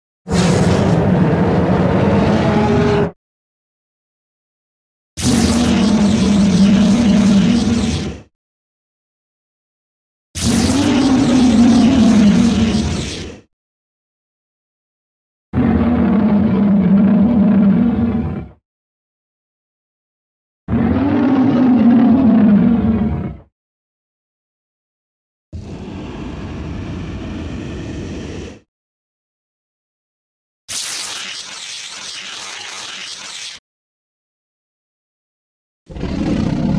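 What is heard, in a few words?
werewolf makes scary sounds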